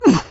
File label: tackle hit